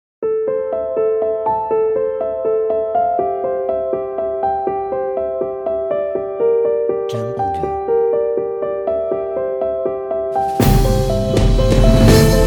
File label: Cinematic Epic Trailer